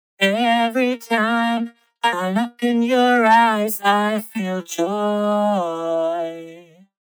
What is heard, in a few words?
Vocals Vocode Robo Vox; Antares